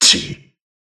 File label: Throw Miss; BBCF; Susanoo